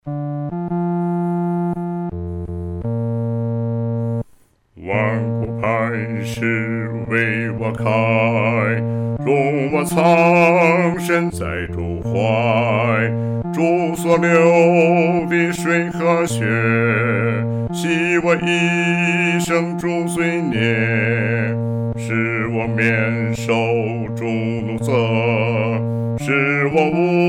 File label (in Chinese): 独唱 第四声; 万古磐石